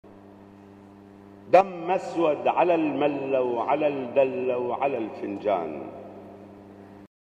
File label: loop